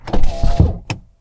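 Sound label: power window close; car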